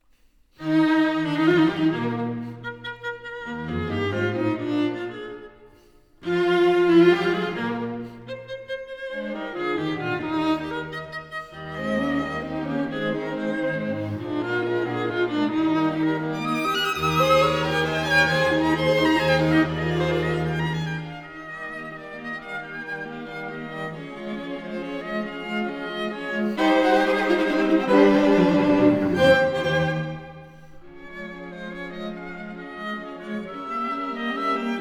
String Quartet in E flat; Quatuor Modigliani; Arriaga; String Quartet no3

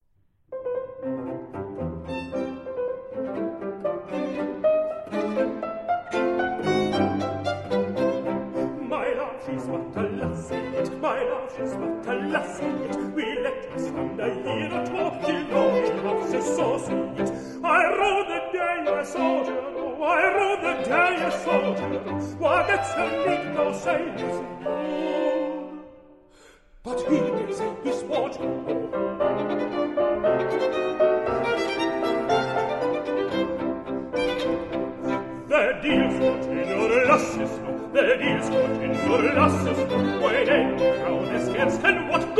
Scottish Airs; Joseph Haydn

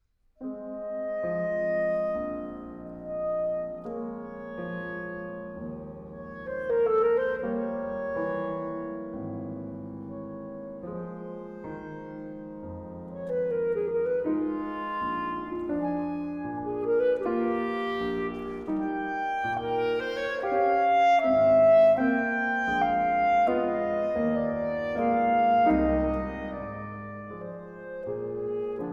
Sonatas for clarinet and piano; Lorenzo Coppola; Brahms

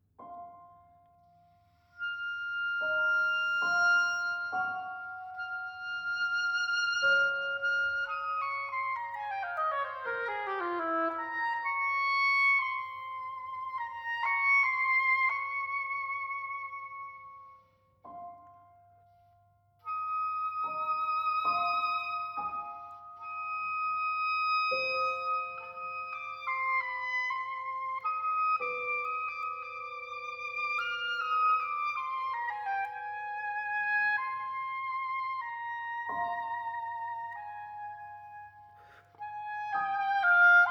Chamber Works for Oboe; Musgrave

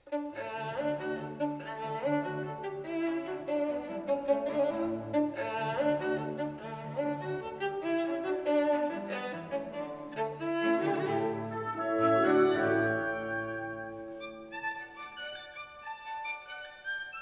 Violin Concerto in D Major; Berg; Beethoven; Violin Concertos; Op 61

